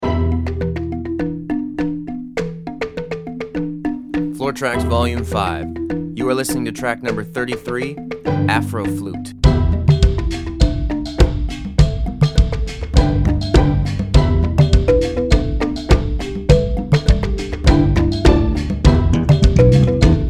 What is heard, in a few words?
afro flute; Jeffery Vigness